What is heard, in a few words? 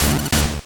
8bit se